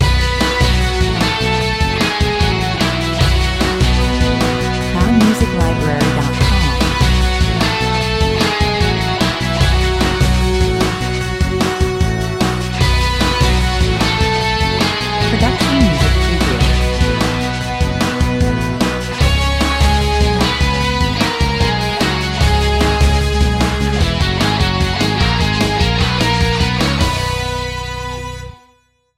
prize show 75 bpm

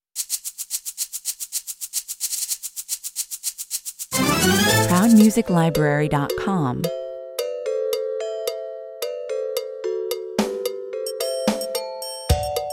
latin fun 108 bpm

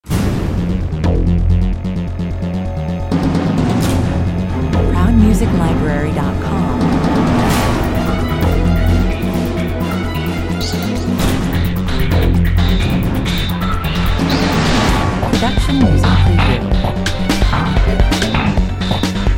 action cues bombing alarm, 151 bpm

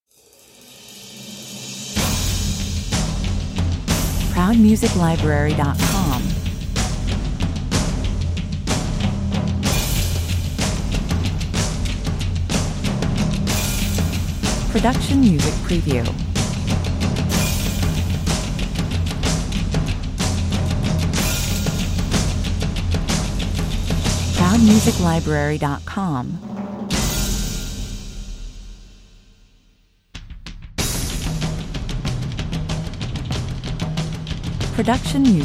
percussion only

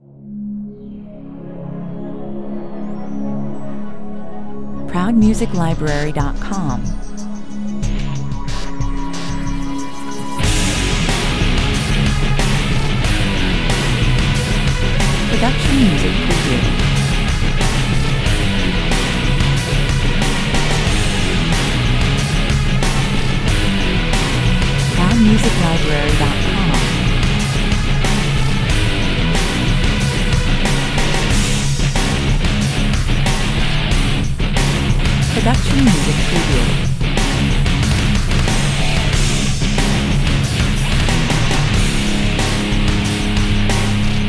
alien matrix loops 92 bpm

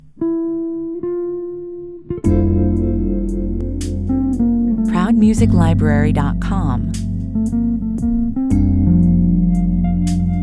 sensual guitar 114 bpm

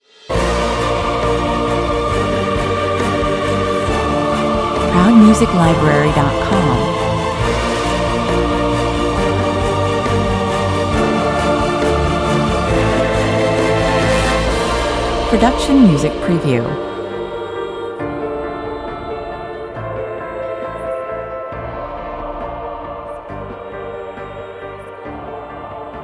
with choir

